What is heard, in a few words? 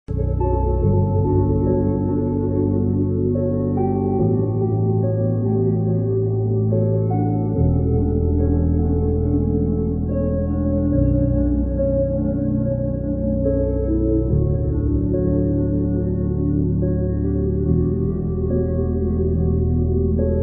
slowed reverb bgm